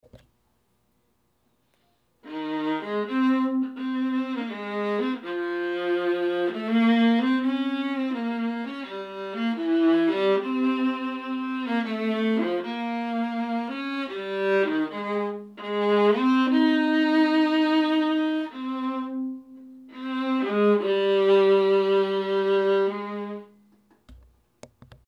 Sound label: Viola; Beethoven